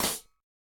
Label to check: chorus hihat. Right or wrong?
Right